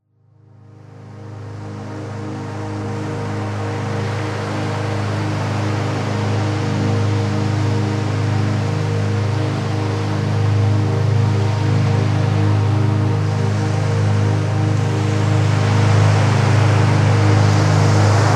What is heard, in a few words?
Waves